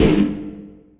Jungle Oneshot; Sonic Foundry (Sony Creative Software); Esoterik Beatz

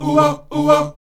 Oh-Wa Oh-Wa, Afro Chants; Roland LCDP11 Africa VOL-1

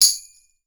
brass, pop rock set

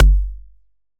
kick layer; kicks

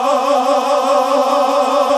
GOSP. AAAHH; Sound & Vision - Gigapack II CD 1 (Roland); HAL Gosp. Aaah 2

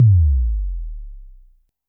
korg trident

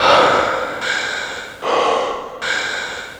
Breath Loop; Electronic Point Blank

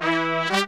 120 AM L, BRASS SAMBA

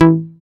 Dance Bass; Sound & Vision - Gigapack II CD 1

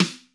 ROOM P STIK, ROOM KITS; Crypton; DrumBase5000

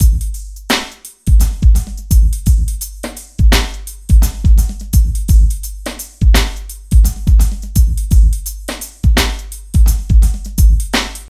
SLOW MOTION 85

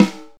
SNARE G#3; Greytsounds VOL-1; SDX SNARES

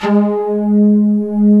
LINEAR SYNTH

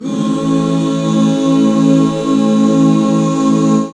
HAL A, BACK CHORDS, Roland; Sound & Vision - Gigapack II CD 1

